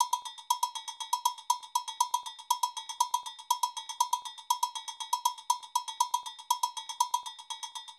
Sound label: 120 Bell, Nu Jazz House; PowerFX